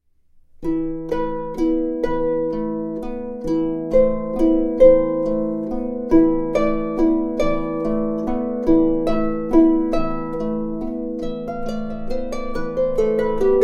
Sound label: harp